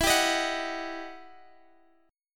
E Minor Major 9th E down Guitar Standard; EmM9; strummed; JGuitar

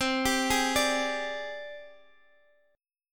G sharp Major Sharp 11th C

